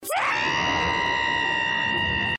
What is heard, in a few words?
Darkness Scream